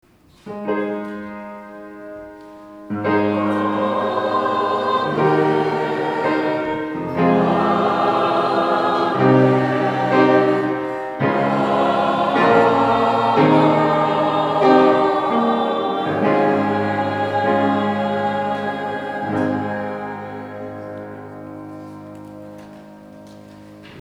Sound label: Amen